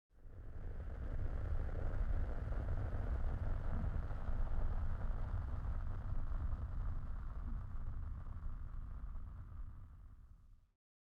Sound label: Thunderstorm Clouds